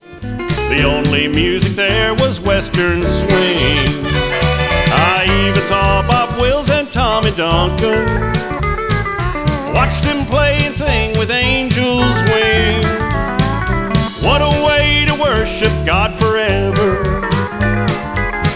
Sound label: Western Swing